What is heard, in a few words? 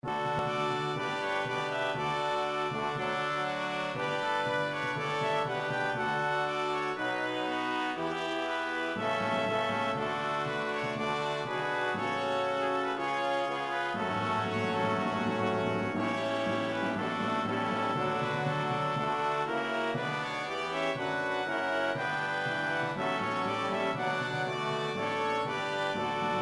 Brass; God the Omnipotent (Russia)